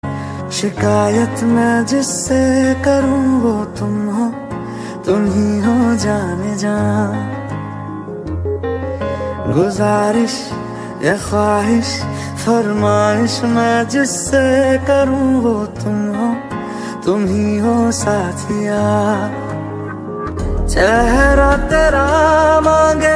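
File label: bollywood emotional ringtone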